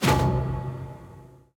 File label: Side quest messages Fail